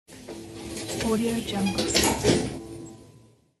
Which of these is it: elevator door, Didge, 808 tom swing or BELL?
elevator door